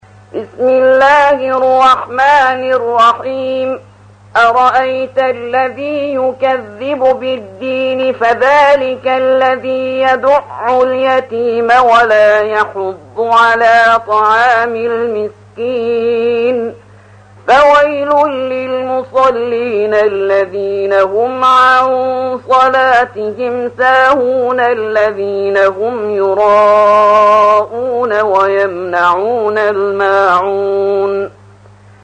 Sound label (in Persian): ترتیل سوره ماعون